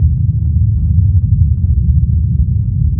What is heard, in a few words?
deep ambient